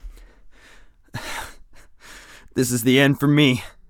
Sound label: hurt near death; Escape from Tarkov; usec2